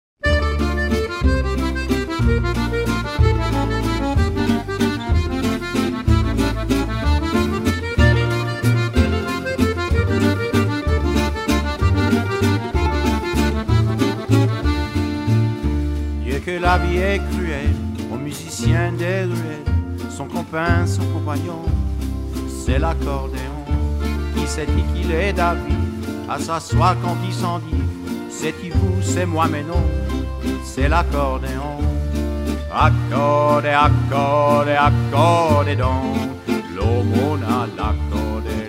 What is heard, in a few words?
L'Accordion